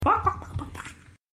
bark; mofive

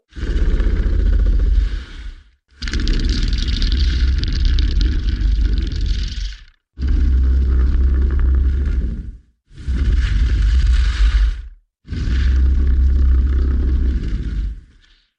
nightmare breathing

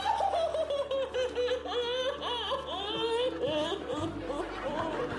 mom crying; bachelor

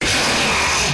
jumpscare sound; five nights in their world

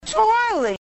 toilet

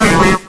bellsprout

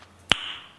snap

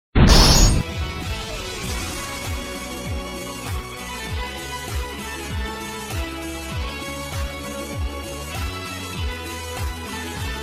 golden buzzer

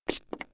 spraying perfume

